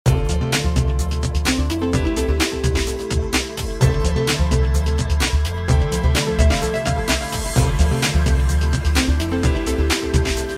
фоновая тема

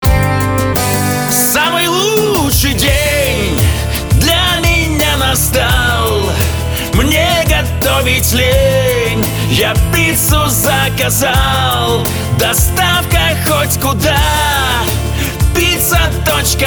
Музыкальный ролик

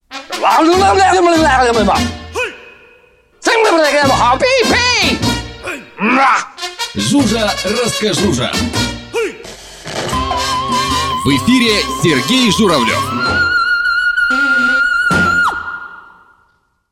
джингл; Жужа

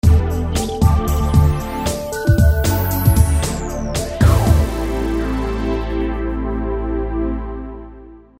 отбивка

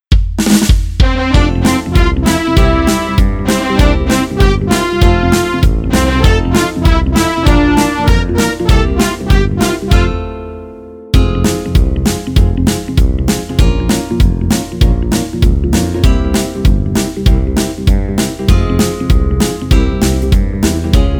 С днем рожденья минус; Маша и медведь

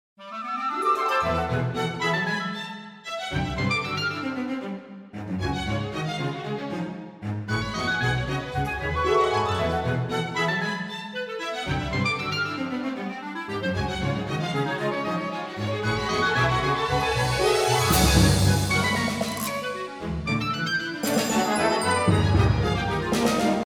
трек для детской игры

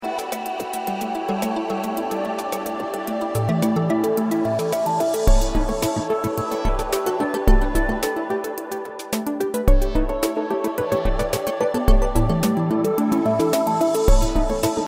фоновая тема

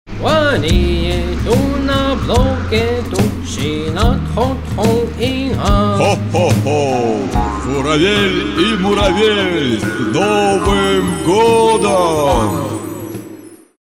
новый год джингл

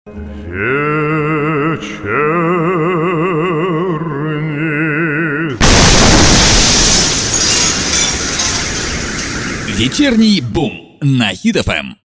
Вечерний джингл; БУМ

